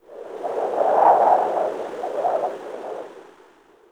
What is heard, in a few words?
Wind Gust Med; Warframe; Venus Open World; Venus Landscape; Ambience